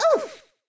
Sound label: oof; sm64coopdx; char-select-extra-chars